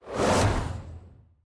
Card Open

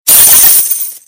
pig Bank

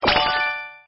red Packet open

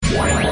Train Upgrade

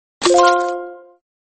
Red Packet Open

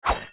Use Sword

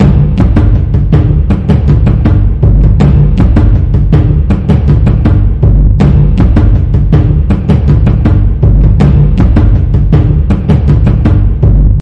battle Bgm

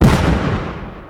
Card Boom

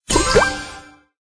prize Window

